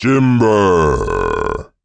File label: death